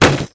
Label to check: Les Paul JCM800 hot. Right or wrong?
Wrong